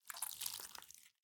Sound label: kraken slither; smite; poseidon